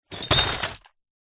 broken glass pieces in cardboard box set down hard on ground; foley; zapsplat